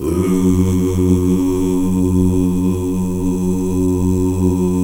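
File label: Gospel; Best Service Gigapack 1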